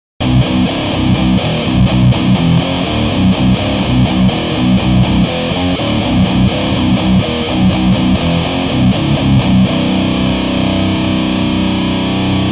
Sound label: Metal Master, pedales